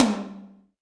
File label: Tom Hi Media, Alesis D4 drum machine